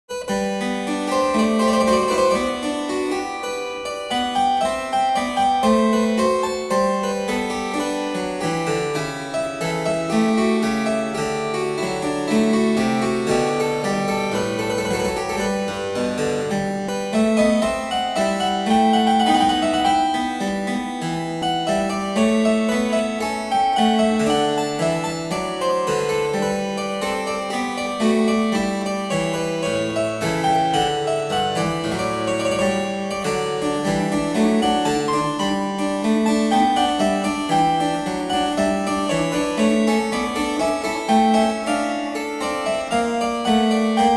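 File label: Suita za cembalo Allemande, harpsichord; JOHANN SEBASTIAN BACH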